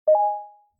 message tone light; tones

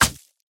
hatchet stone strike soft; weapons; rust

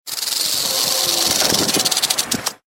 snake; jeux

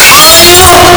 ambient cave; LumiCu 2.0; minecraft; nerdcraft

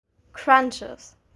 Crunches

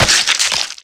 break; FortressForever

